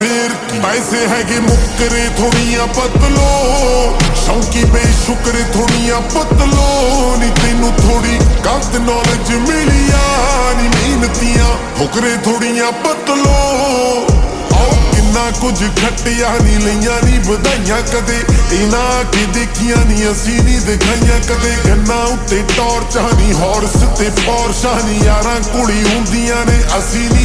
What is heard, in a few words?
Ringtone Slowed Reverb